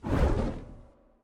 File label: wingflap; smite; thanatos